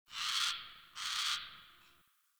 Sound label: Tree Critters; Warframe; Duviri